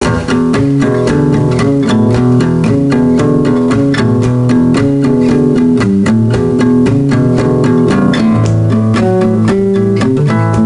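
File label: Ambience Music; Junior Mystery Quest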